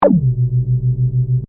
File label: Scanner Beam Ray, Scifi; Heavy, Science, Fiction; Tech Sound Effects; SoundEffects-BlastWave